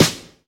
Claps & Snares

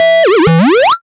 Synth Shot; Vengeance Samples

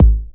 Bassdrums

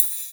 Ride, Cymbals; Vengeance Samples

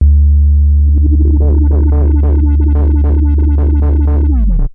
Roland B D2, Roland S100; musicradar-synth-samples

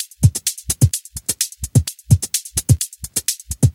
Minimal Loops

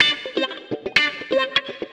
125 c, guitar licks; house; Vocal House; PS-28 Vocal House Samples; instrumentloops

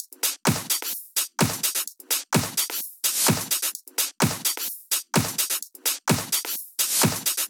Sunshine Drums 1, 128BPM; Vengeance Samples; Sunshine Kit

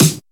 Snare; Vengeance Samples

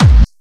Bassdrums Clubby Kicks; Vengeance Samples

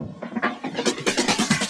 Loops Fillin140; Vengeance Samples; VEC1 Fills

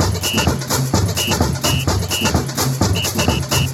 Latin House Loop 128 BPM; Vengeance Samples

